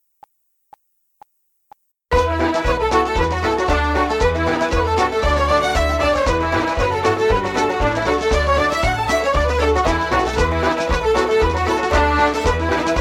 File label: Irish Ceili Reels Medley Em, backing track